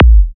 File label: Pure Psytrance Kick